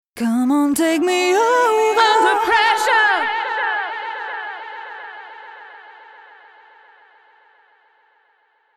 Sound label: delay